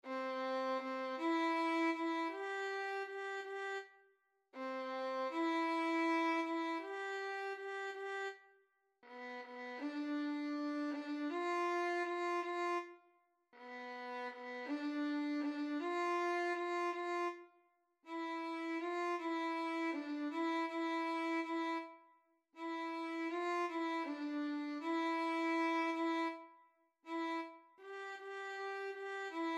Simple Violin

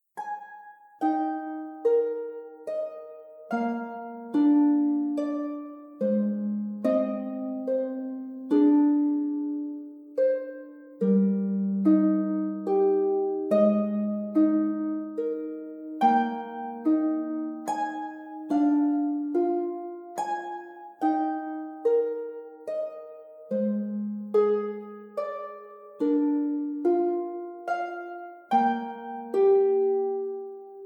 Little Harp Pieces

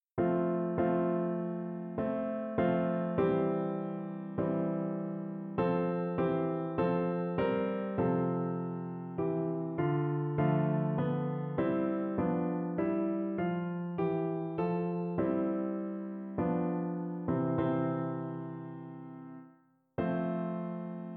O Come All Ye Faithful Piano

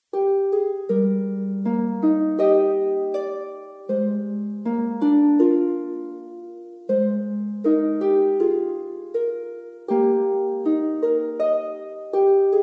Little Harp Pieces

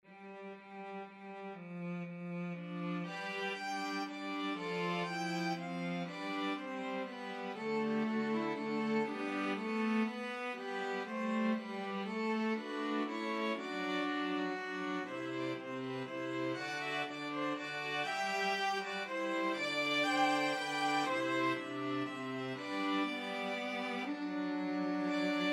Arranged for String Quartet

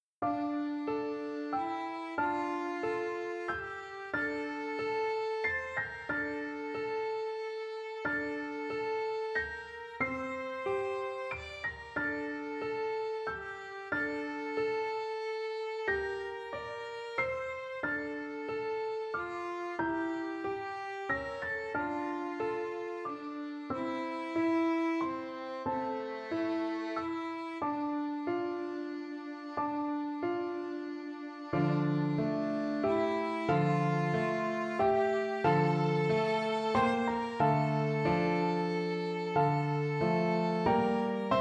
Violin and Piano Easy; Pirates of the Caribbean Dead Mans Chest

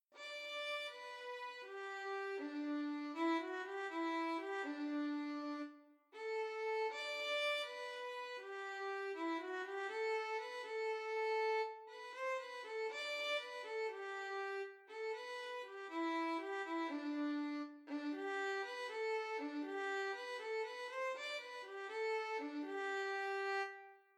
Daisy Bell Arrangement for Easy Violin in G Major

